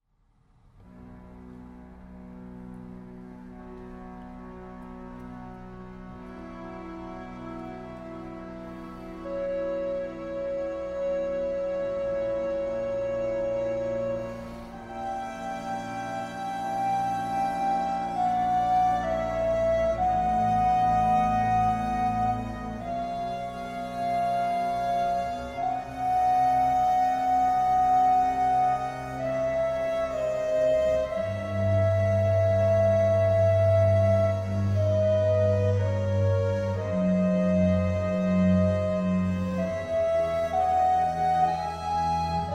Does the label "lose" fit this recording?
No